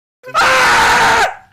scream

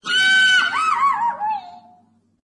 high pitch goofy yell

